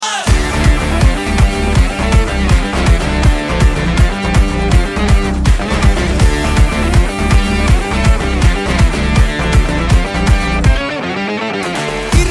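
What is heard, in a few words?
background music Ringtone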